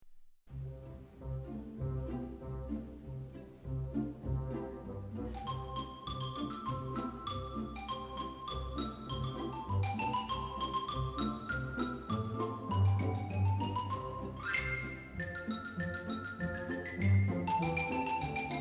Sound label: Xylophone; Doodles